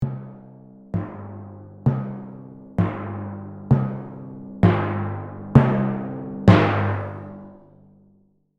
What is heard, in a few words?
Cartoon timpani drum crescendo slow; drums and percussion